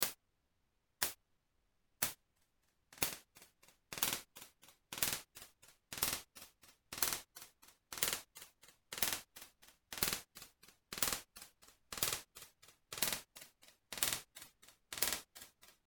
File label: pipe